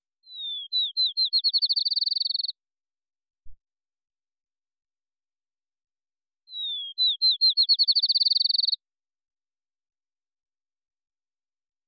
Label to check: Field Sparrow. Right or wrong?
Right